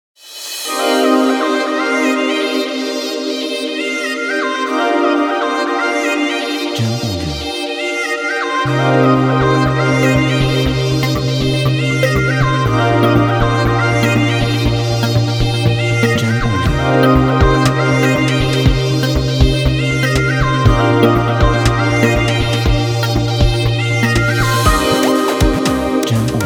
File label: Night City Vlog